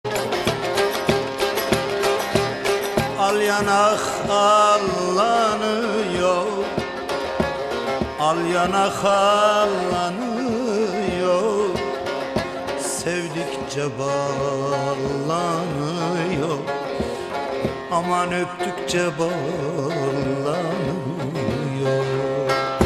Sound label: Trap